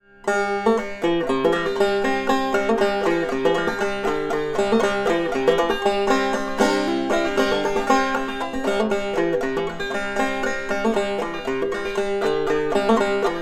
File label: banjo, African Blackwood tonerings; Recordings: M-Audio microphone and Fostex MR16 recording studio; Soldier's Joy; From a light touch to a very strong attack, this rim can project with amazing clarity.